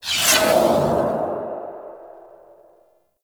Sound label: Katana Invisibility Deactivate; Warframe; Excalibur Umbra